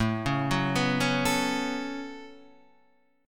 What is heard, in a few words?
A 6th Add 9th A down Guitar Open D